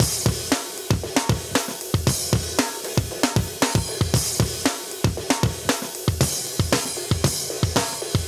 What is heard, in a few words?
116 live breaks